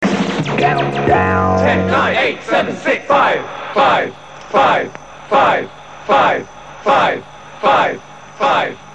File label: Countdown Jingles